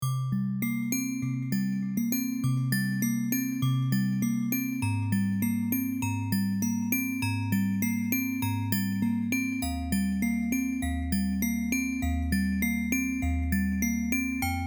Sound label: Glass Bells Dancing With A Synthesizer; Ambient